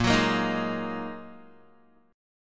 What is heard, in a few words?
Bb 7th Suspended 4th Sharp 5th, Guitar; strummed; 6,9,8,8,8,x; 4E,4A,5D,5G,5C,6F; Bb7sus4#5